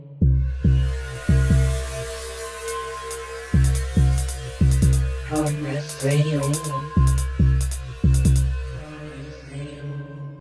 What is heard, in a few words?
jingle; congress radio